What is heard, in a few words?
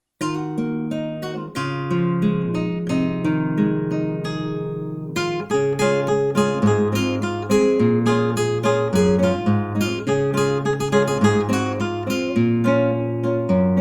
B.B.B.F.F. (Classical Guitar)